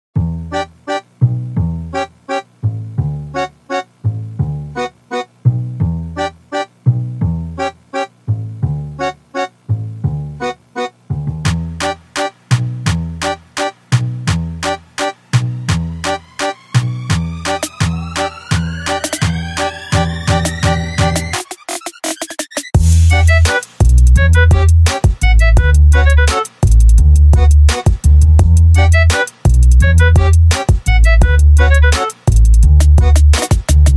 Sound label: Trap Remix; Animal Crossing; Able Sisters